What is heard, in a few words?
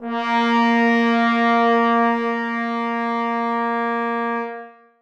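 trombones sustain a#3; Sonatina Symphonic Orchestra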